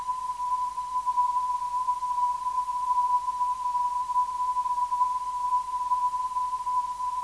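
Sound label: 340kHz, non-directional beacon; OCT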